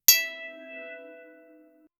blacksmith crafting

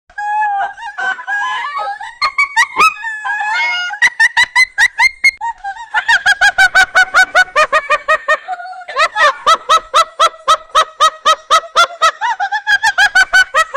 two nigerians illegal, laughter

